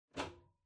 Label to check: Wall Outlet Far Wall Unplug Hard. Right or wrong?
Right